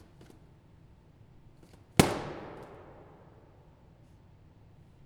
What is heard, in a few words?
reverb Frary dining hall; Pomona College